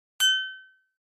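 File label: Flash Beep High Guitar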